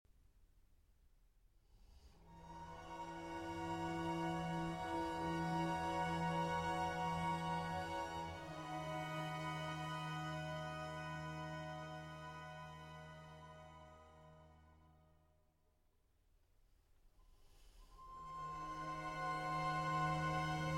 Violin Concerto in E major

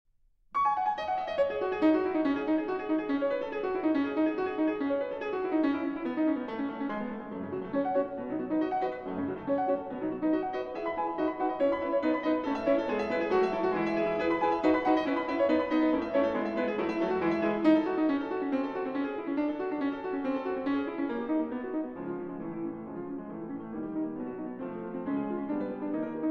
Pour le piano, Toccata; Debussy; L. 95 III.

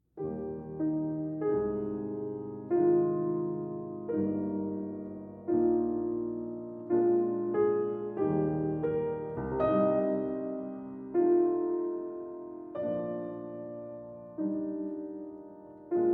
Adagio; Variations on an Original Theme, Op. 36 Enigma Var. IX.; Nimrod

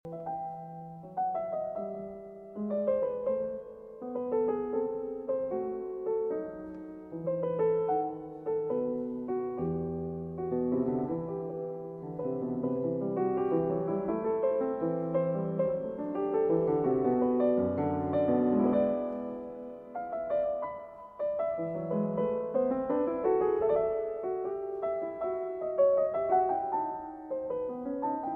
Partita in E Minor, Allemanda; Partita No. 6; BWV 830 II.

